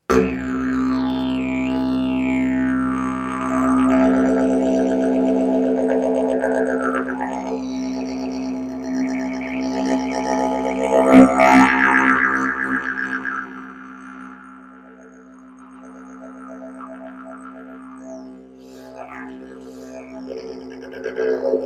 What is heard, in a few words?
didgeridoo